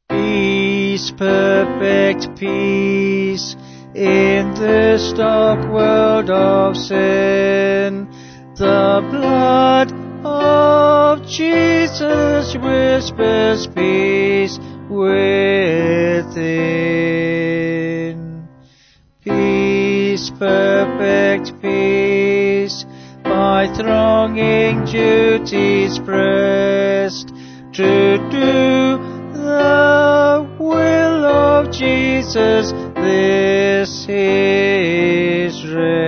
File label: Vocals Piano; Peace Perfect Peace; Pax Tecum